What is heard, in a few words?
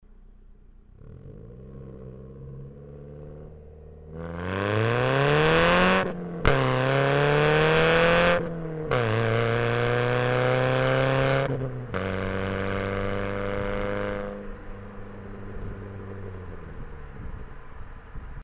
bumper warm driving